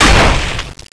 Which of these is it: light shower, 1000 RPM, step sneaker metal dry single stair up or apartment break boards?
apartment break boards